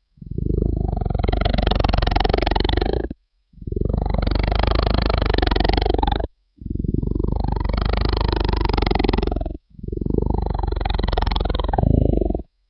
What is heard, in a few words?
giant monster angler fish